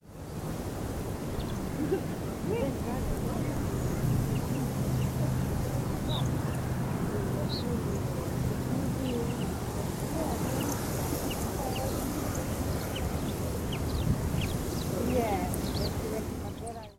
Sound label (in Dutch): Dwerggors; Nolle 11okt2015